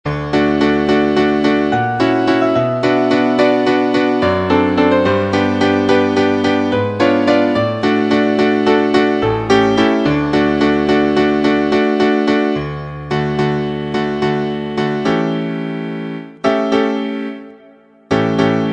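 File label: accompaniments, Trumpet in D; Vocalise #6; concone; Rudimentary Transposition Studies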